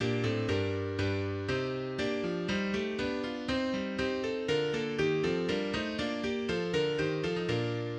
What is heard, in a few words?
bach bass